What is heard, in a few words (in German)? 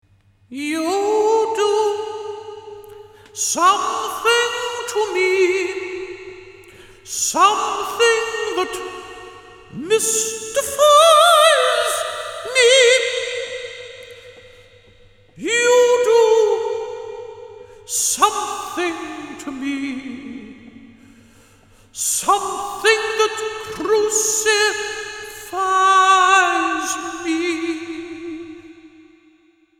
Gesang LARGE; LXP-1